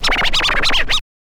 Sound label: scratch kit, vinyl; Masterbits; Vinyl Attack